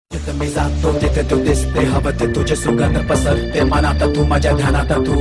Sms Tone

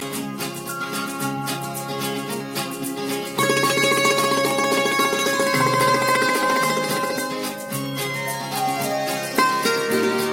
Santoor

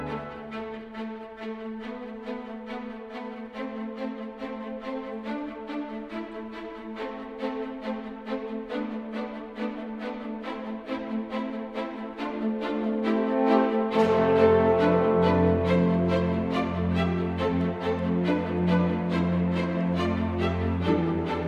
Fanfare

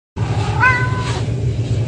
Trill; Ginger

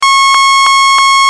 Beep

